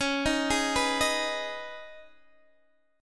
C sharp 7th Suspended 2nd, C sharp, down, Guitar, Open D